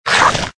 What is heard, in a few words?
jack o lantern damage, monster